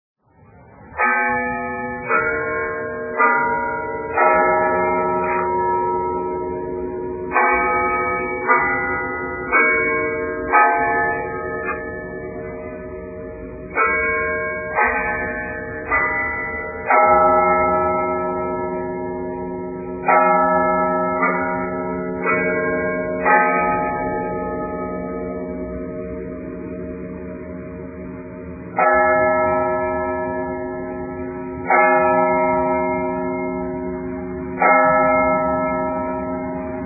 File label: bells